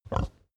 pig grunt short

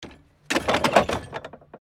garden door close

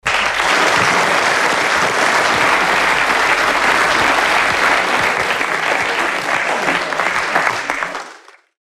gameshow applause

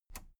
plastic button latch loose release enable

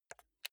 console controller playstation press slow soft

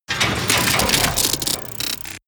robot transformation metal

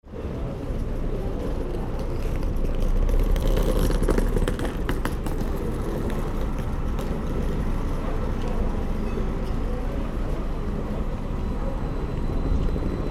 trainstation trolleys passing by

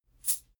chain mail armor movement

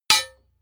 hammer hit metal

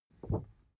cardboard wobble short soft

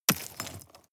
drop stones impact

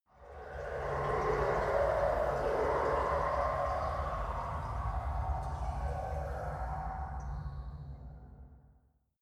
mechanic ambience dark rain

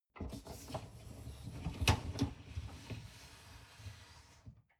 dishwasher upper tray draw out long

